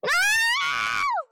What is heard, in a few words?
screaming monkey